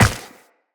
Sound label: Run Ice, Footsteps; Tenno